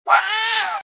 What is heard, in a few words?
fox hurt